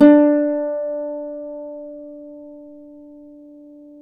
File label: Celtic Harp, Plucked Strings